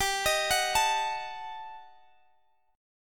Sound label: Eb Diminished G; Ebdim/G arpeggiated; JGuitar